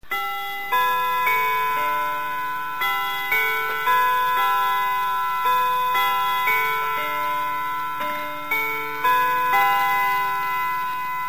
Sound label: westminster, clock chime tunes